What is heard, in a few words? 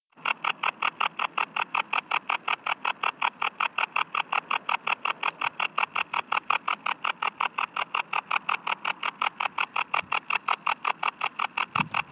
bog bush cricket